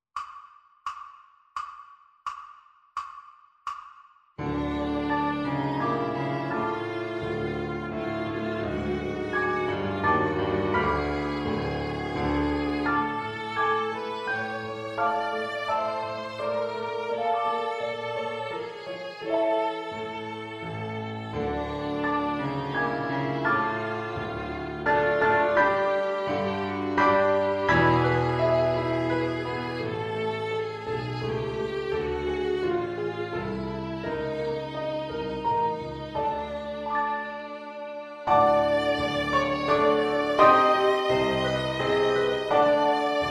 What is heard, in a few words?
Violin Piano Backing Track; ViolinSchool